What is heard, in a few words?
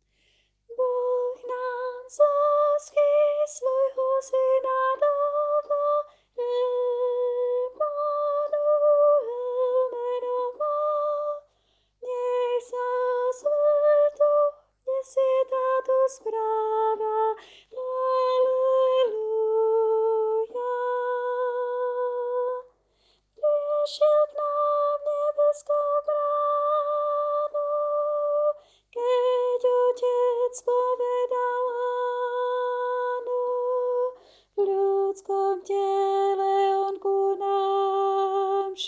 Tenor; Vianoce 2019 - Boh nám z lásky; Farnosť Letanovce